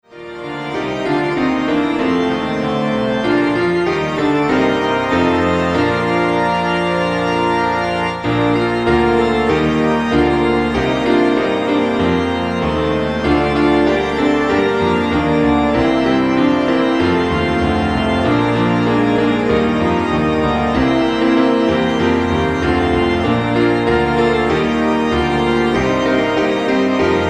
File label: Piano-Organ Short; Celebrating Grace